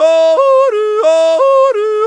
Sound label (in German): JODLER